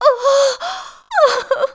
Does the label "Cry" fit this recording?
Yes